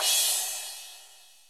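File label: D70 Crash, Roland D-70; Drums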